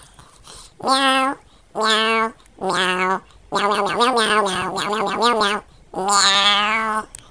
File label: meow